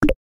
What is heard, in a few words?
turn on click; minecraft